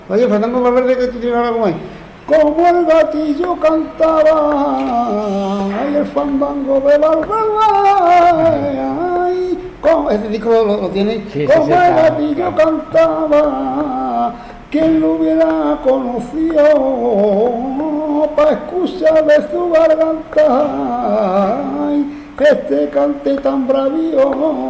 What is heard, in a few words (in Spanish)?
Fandango, flamenco; de Valverde